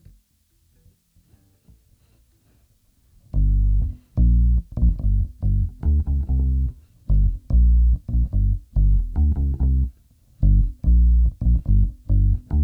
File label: Bass